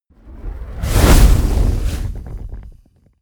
whoosh fire ignite medium

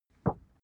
cardboard wobble short hard

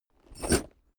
backpack metal single

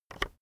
keyboard external enter push soft

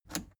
plastic button scratch smooth push enable

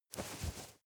nylon leather very soft

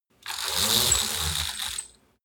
roll-down curtain roll down

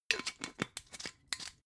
glass bottle massive close scratch

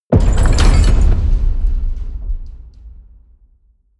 gate slam large

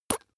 bath duck hit fart

